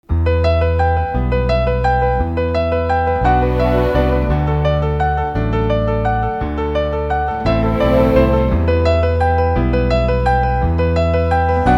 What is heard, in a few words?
instrumental, persian